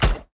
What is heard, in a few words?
heroes tar bomb cast